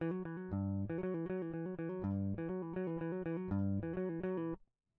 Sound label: electric